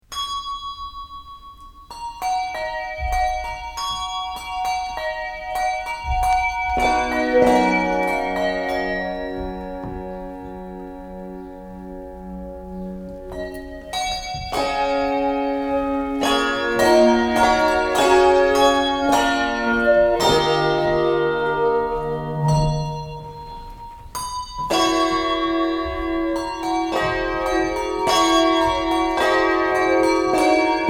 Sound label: ringing clear